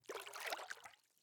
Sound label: watersplash